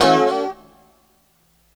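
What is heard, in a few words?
G#3 A mono slide R, guitars; Spectrasonics; Hans Zimmer Guitars VOL-1; 90's Sample CDs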